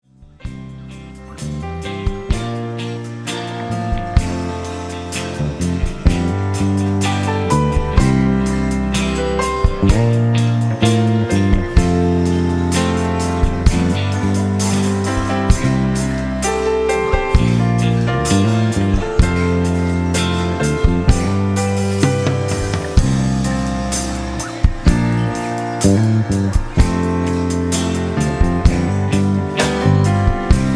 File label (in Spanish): Cancion instrumental; MINSAIT; accenture